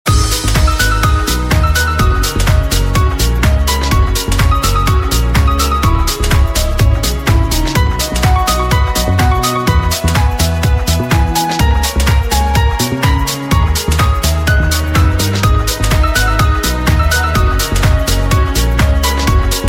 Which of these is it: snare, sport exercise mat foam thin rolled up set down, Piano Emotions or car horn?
Piano Emotions